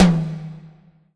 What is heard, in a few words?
Korg N1R High Tom 2